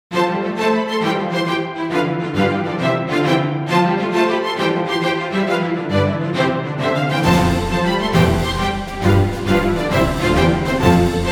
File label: Classical March